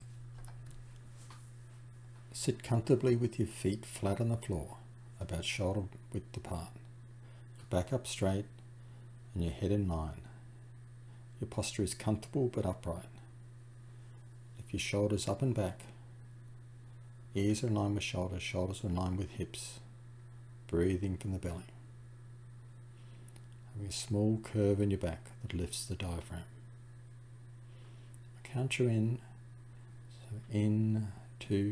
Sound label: Soothing Rhythm Breathing